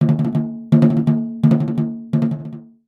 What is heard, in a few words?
Egyptian Dance